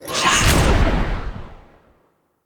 Staff Cast Short; Weapons; Enemy; Staves; Mnd Wazard Staff

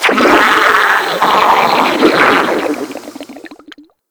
Grineer Frogman Death, Vocals; Warframe

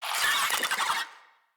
Infested Ashen Vocal Attack; Enemies

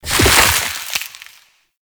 Proc Cold Max; Warframe; Mods

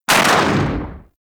Briefcase Launcher Shot, Weapons; Warframe; Corpus

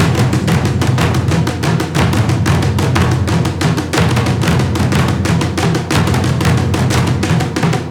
Loot Run Cue A Loop; Warframe; Orokin

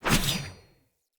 Short Sword Whoosh Heavy A; Weapons; Enemy; Swords; SinecureShortSword

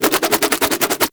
Quick Spin Whoosh; Weapons; Nunchuck; Warframe; Infested

